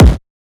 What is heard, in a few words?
Kick